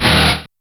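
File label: Motor; Yamaha DTXpress; Effect 1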